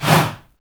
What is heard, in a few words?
"HA", Heart of Africa, shout; Spectrasonics; Heart of Africa 1; CH. SHOUT1